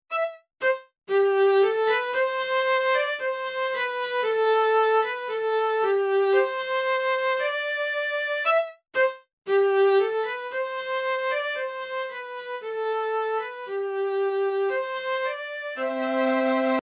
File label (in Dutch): Viool